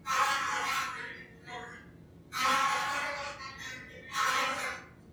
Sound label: flies; dfcaverns; df ambience